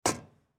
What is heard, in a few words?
knife throw impact